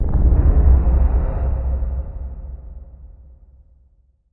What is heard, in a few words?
Bass Impact Eerie Di; impacts